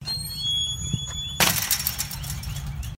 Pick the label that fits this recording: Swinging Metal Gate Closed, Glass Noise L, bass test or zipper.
Swinging Metal Gate Closed